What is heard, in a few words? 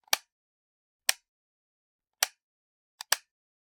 Generic Button Plastic Tiny